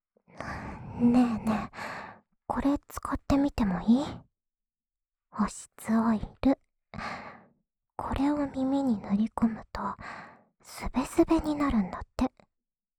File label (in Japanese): 安眠用 精油按摩(右耳), ASMR